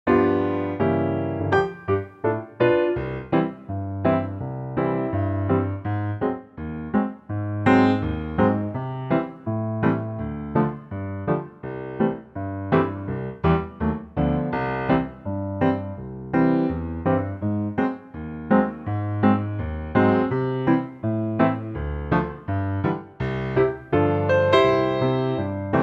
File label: C piano